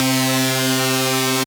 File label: C Synth, One Shots; Electronisounds; Vibration Expedition